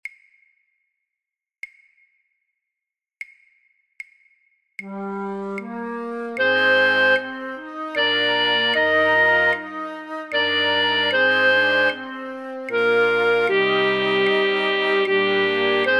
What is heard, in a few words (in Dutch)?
fluit; Holst; Jupiter; Andante maestoso